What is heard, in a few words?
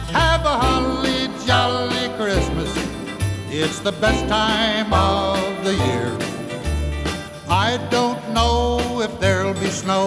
xmas song